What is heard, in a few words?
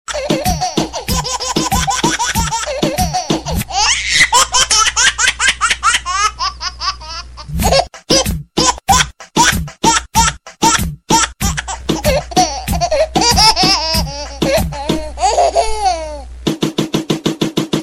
baby rap